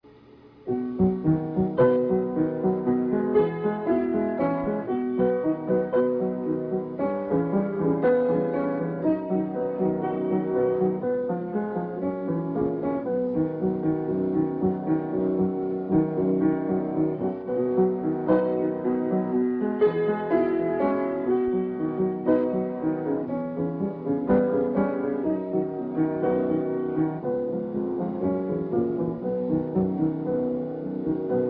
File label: Music Box